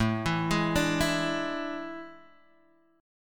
A Major 7th Suspended 4th, Guitar Drop D; chord

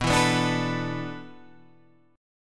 E 7th Suspended 4th

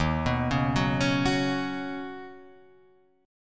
Eb Minor 7th Sharp 5th Eb down, chord; arpeggiated

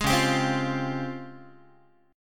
C sharp Major Sharp 11th, Guitar; C#M#11; strummed; tuning=4A,4E,5A,5D,5G#,6C#; guitar chord

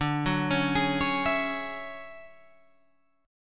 D Major Sharp 11th D Guitar Open G, chord

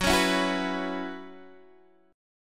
F sharp 7th Suspended 4th, Guitar, Open D

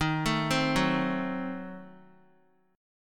Eb Diminished 7th Eb Guitar; Ebdim7 arpeggiated; x,6,7,5,6,x; JGuitar

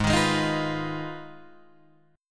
Ab Minor 6th, Ab, Guitar, Open G

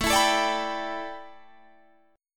A 7th Suspended 2nd Suspended 4th

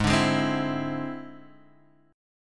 E Augmented 7th; chord shape

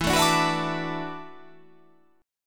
E 9th Suspended 4th E down

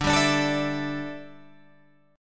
D Suspended 2nd Suspended 4th, Guitar, Open G

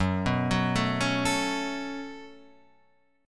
B 7th Suspended 2nd, Gb

